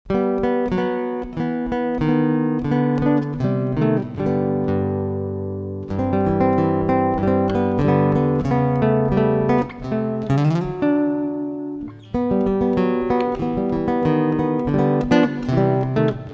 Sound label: Jingle Bells